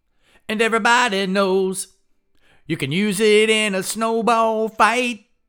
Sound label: vocals